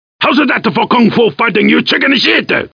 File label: kung fu